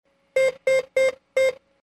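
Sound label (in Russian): пин код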